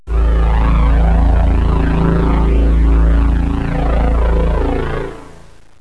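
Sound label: ambient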